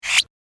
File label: Short Fast Plastic Swipe, whoosh